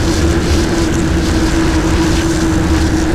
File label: Tornado Weapon Loop; Destruction